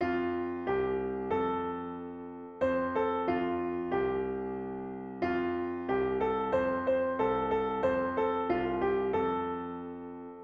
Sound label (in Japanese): ピアノ